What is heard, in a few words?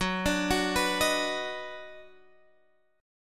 Eb Minor Major 7th Sharp 5th Gb, chord